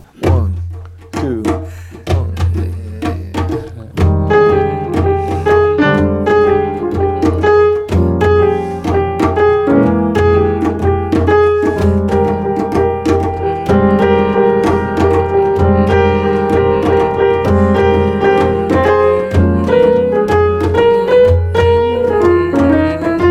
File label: minor blues; jazz; Mike Longo